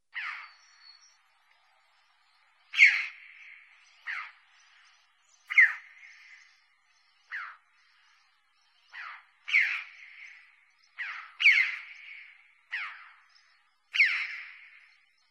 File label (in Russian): klushica golos